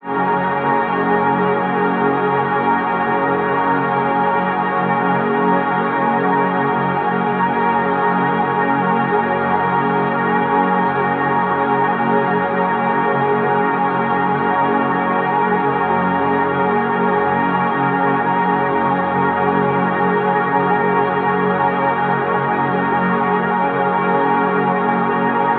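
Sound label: pad loop arrival 150 F# major; Virtual Riot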